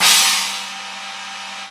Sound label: Yamaha EX5, Drums